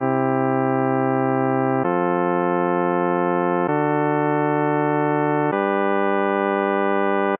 modulation cdur gdur akkorde

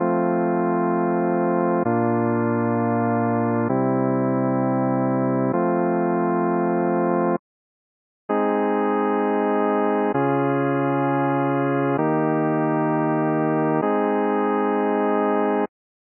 c dur as dur gleichstufig

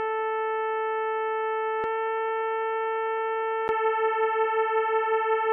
c dur g dur

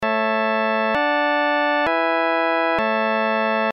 kadenz rein

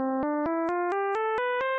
tonleiter

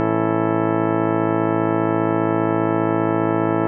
Schussakkord richtig

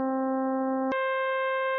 oktav

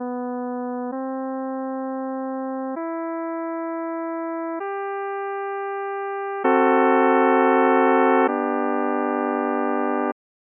h c e g a g in g dur

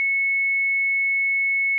hohe terz

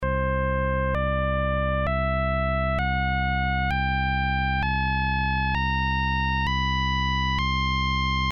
oberton

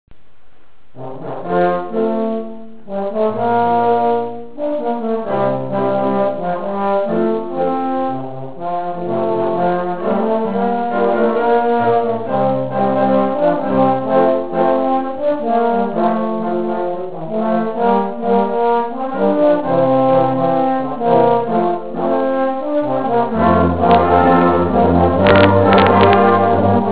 modern, posaunen; kilchberg

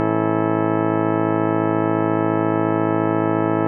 Schussakkord tief

